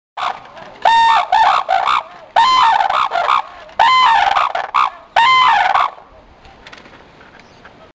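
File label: red-crowned crane voice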